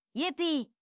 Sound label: yippee; teto